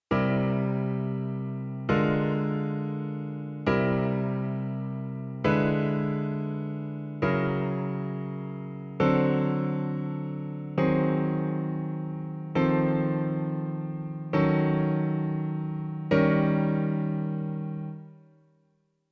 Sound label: 12tet 7th chords